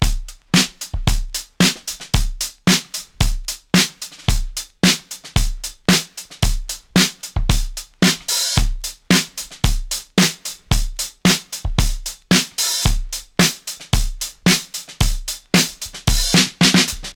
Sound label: 112 bpm drum beat c sharp key